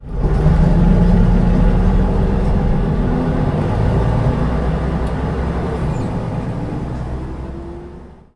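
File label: leyland titan b15, bus; Simutrans; Pak128.Britain-Ex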